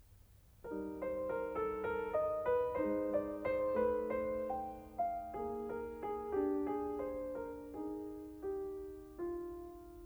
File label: Carnaval for piano; Schumann; Op9; Rubinstein